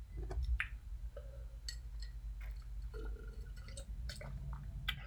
slurp

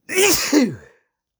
sneeze

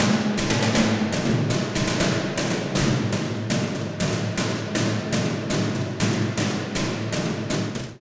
Rec Timpani; Davide Siervo

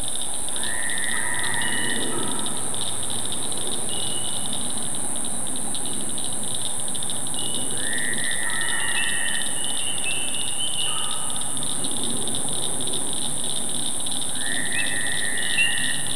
Canada Goose, Branta canadensis; Aves; iSoundNat

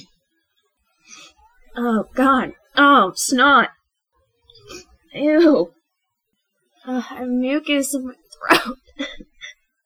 crying

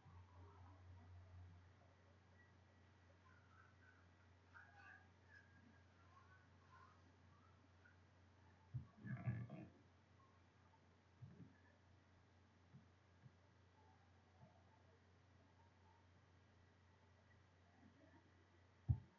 backnoise; Ten2Zero

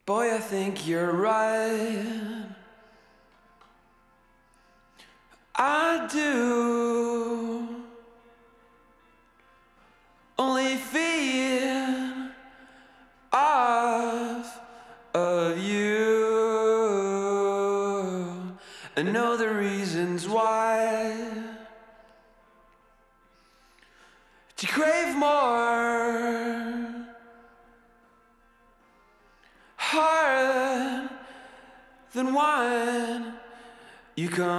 vocals; musdb18hq

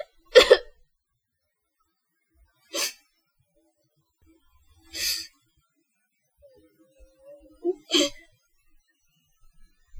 crying

